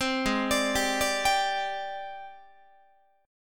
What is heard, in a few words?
G sharp Major Sharp 11th, Guitar; G#M#11; 15,16,17,17,18,18; arpeggiated